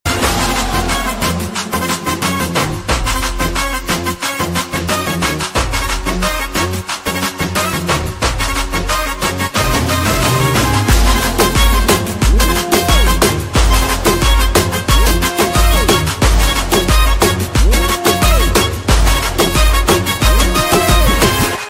bgm ringtone song ringtone music

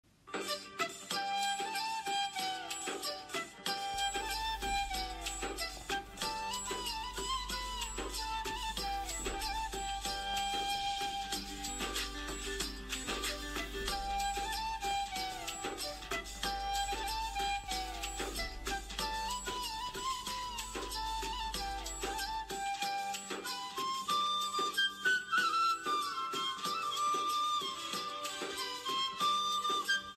melody ringtone; happy new year ringtone

